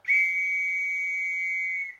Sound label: whistle